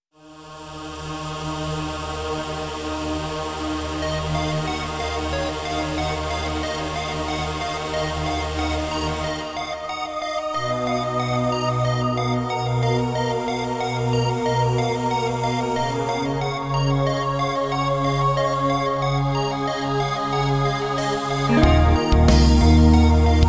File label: smooth groove song